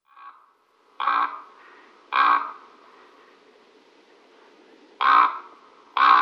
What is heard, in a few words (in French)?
grand corbeau